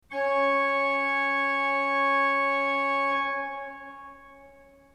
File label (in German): ton, orgel